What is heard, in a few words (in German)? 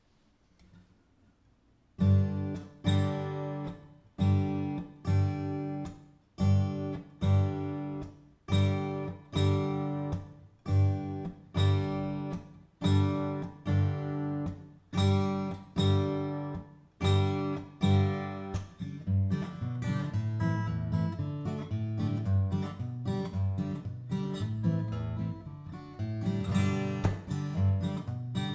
nikolauslied ohne gesang ohne intro